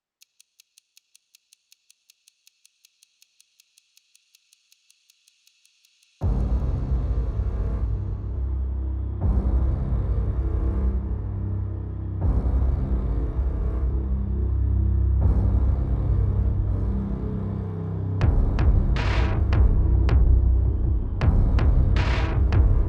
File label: Reaching The Peak Underscore, Epic Hybrid; Narrative Mind